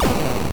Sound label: explosion